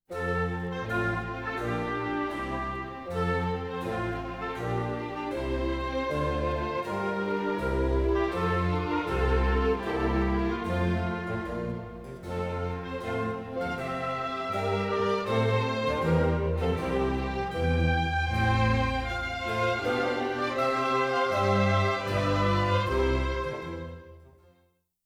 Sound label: air water music